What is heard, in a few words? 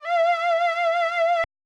Pothole Pad